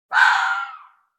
animals fox red mating call single; sound effects one

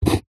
wood scrape sharp knife score single; foley; zapsplat

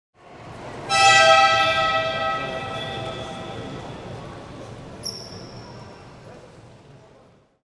Air Horns, Weak Referee Whistle, Crowd; Sound Ex Machina

